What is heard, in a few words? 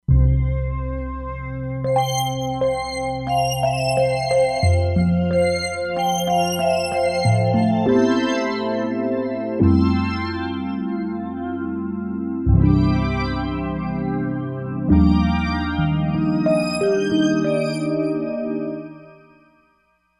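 Asiatic Drone, E-mu Proteus-3; Factory patches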